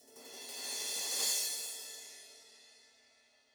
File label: Crash Flourish; cymbal; apulSoft apTrigga2; Multi-Velocity Cymbal Samples